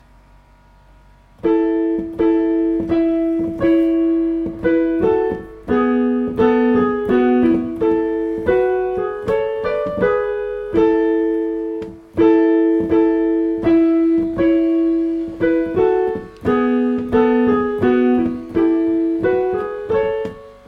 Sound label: Piano